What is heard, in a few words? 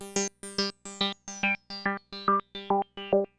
Bass